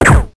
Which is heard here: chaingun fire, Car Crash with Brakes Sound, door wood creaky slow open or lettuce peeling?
chaingun fire